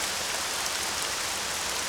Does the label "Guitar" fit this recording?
No